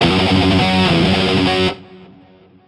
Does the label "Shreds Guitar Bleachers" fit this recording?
Yes